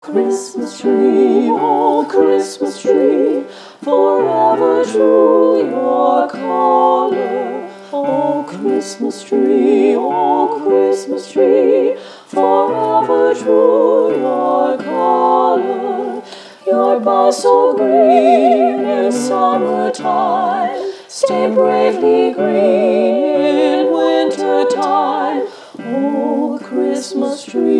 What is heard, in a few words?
a cappella; o christmas tree